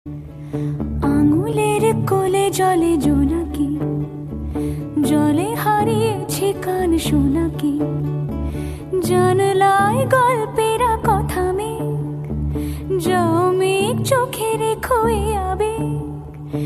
Song

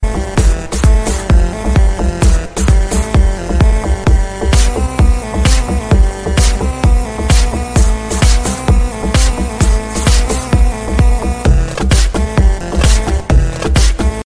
Remix Arabic Best Ringtone

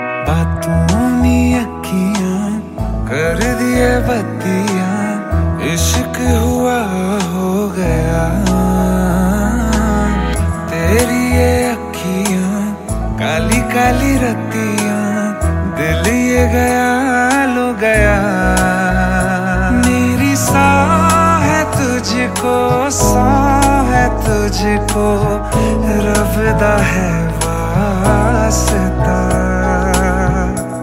Song; Bhuj Movie

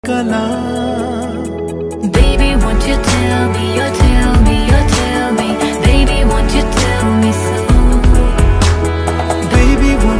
Telugu Song

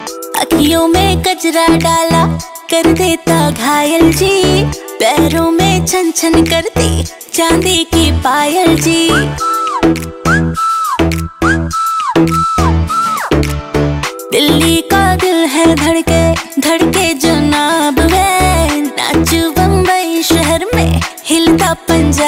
Ringtone

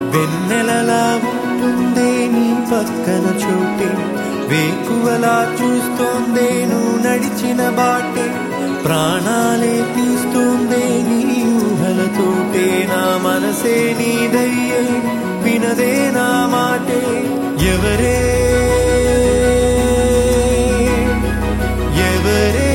Telugu Ringtone

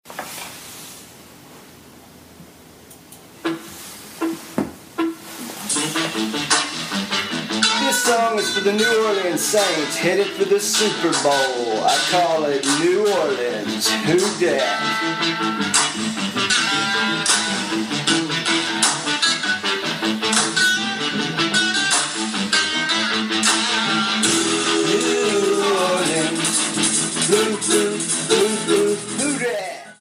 Fight song; New Orleans Saints

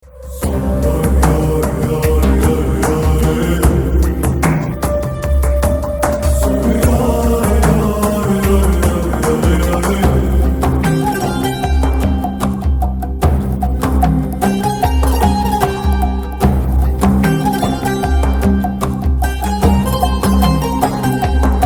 Music Instrumental Tone; Dosti; Ajaydev Gon

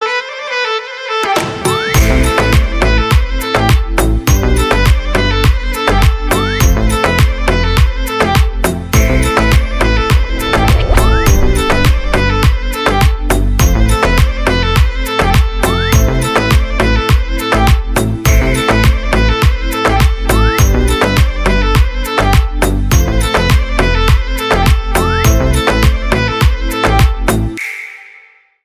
Instrumental Slow Slow Ringtone

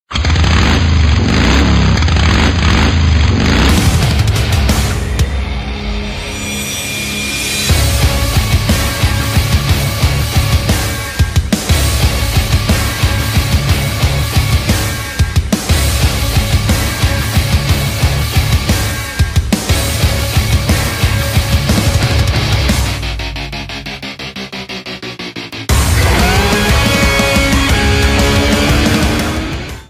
Theme Song ringtone; Baron Corbin

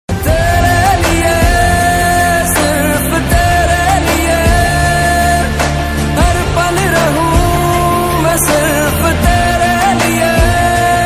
Love Ringtone

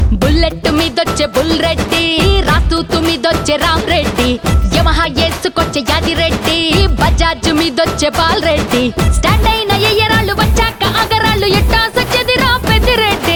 Telugu; Sita Telugu Movie

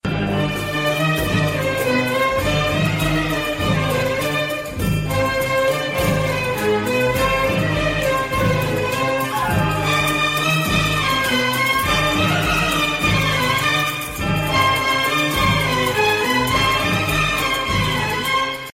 Arabic Unique Ringtone

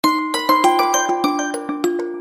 iphone sms ringtone

